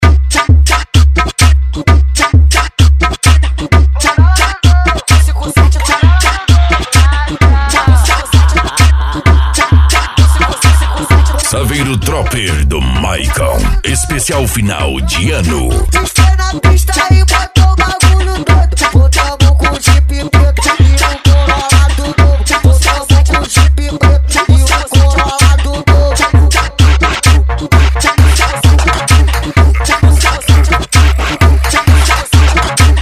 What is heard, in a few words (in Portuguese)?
funk agressivo funk